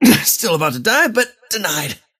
deny pain; dota; kez

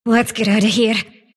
sylph move pain; dota; dark willow

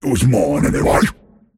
deny pain; pudge

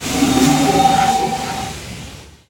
replicate; weapons; dota; morphling

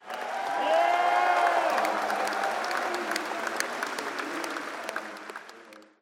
crowd; dota; spectator

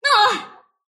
sylph pain; dota; dark willow

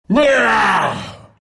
drag anger; dota

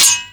melee hit; weapons; dota; creep

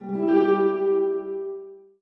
guardian; weapons; dota; omniknight

